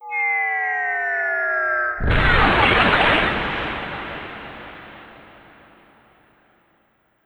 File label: bomb drop exploding; mixkit